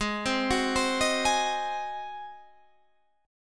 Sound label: G sharp 7th G sharp, Guitar Drop D